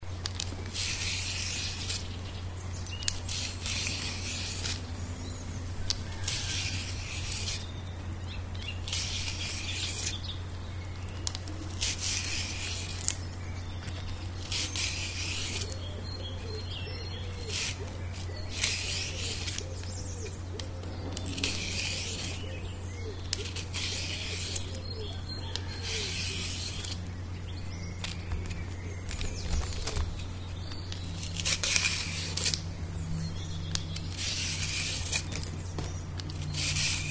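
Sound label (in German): Spargelschälen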